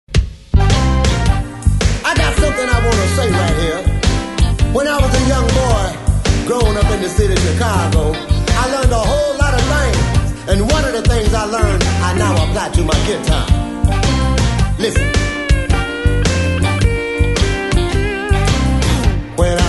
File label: Guitar Licker